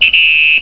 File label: whistle; The Cruncher 1.1 Demo; Cruncher Candy